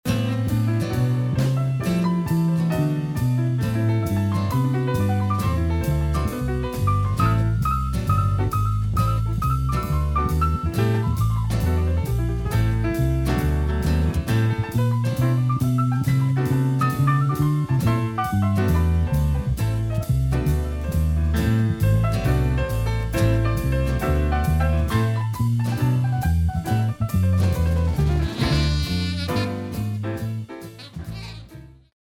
Piano Solo

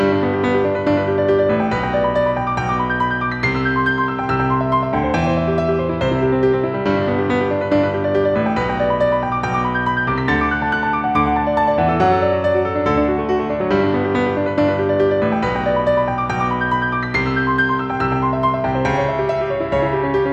Rippling Fingers Exercise

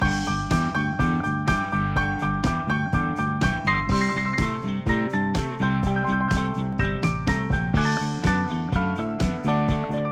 Piano Solo

